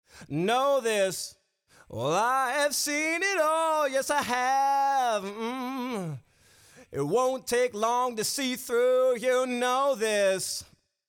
Male Vocal; Cambridge

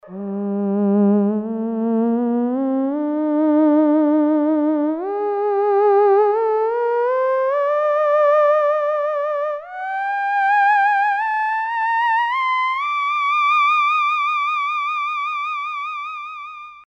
theremin; BIG ETHERWAVE

